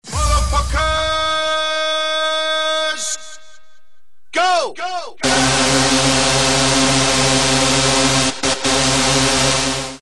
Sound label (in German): Jingels